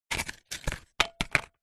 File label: papadums x2 set down on empty plate